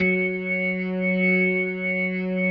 F#3S, B3, Vintage Keyboards

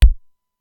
BD, Simmons SDS-9; Factory C5

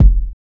Kick, Trance

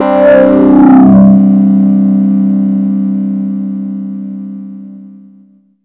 SE-1 Overdrivin'; E-MU Producer Series Vol. 6 – Analogia Project; Strictly Synthe

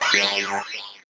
VocoBit Noise, 8-bit; musicradar; 8-bit bonanza

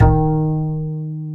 Acoustic Bass

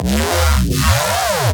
Reese Bass F; Antidote Advent

